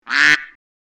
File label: Duck quack single call; bird